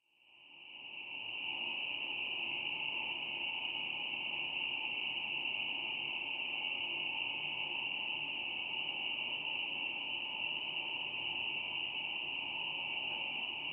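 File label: Crickets Night Ambience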